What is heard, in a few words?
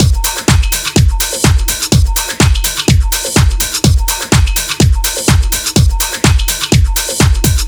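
Groove King, disco house; диско хаус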